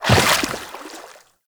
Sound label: Step Water, Footsteps Walk; Warframe; Characters; Tenno